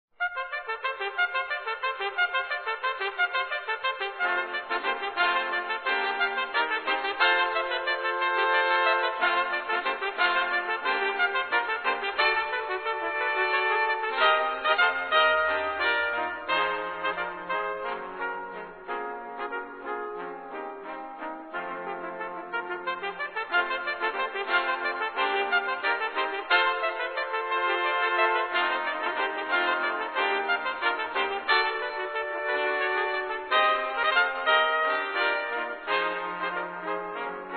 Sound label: carillon; tpt ensemble; Carillon Fanfare; Bryan, P.; Quintet